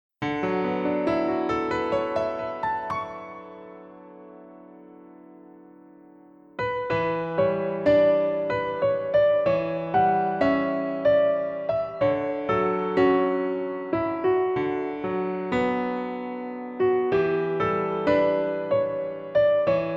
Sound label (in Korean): Piano ver 멜로디MR